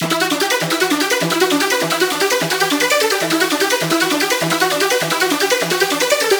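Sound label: synth loop trance feels 150 E minor; Virtual Riot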